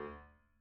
Piano No Sus Rel Close D2, Grand Piano, Steinway B; Chordophones